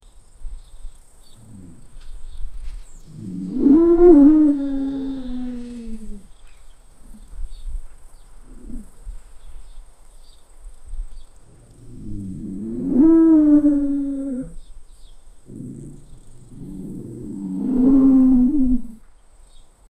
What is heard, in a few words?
wild boar growels in ange; animal vocalization